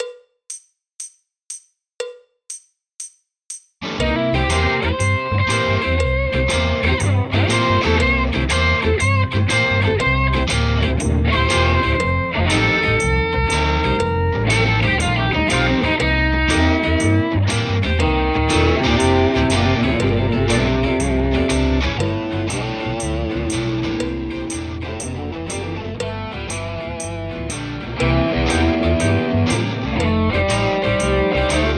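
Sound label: Drum Kit, Drum Kit backing tracks; Grade Four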